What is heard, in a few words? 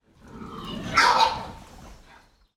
pig grunt squeal inside barn wet sloppy mud